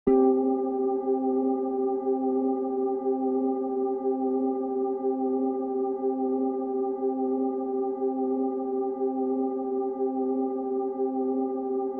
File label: ambient